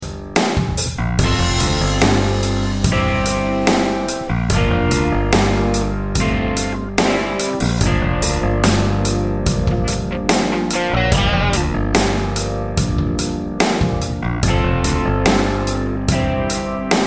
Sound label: crunch